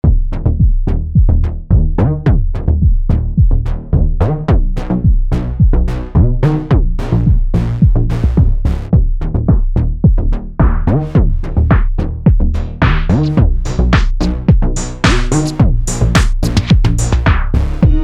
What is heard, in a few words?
Drum Mover